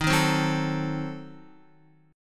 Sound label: Eb Diminished 7th, Guitar; Ebdim7 strummed; x,6,3,5,6,5; JGuitar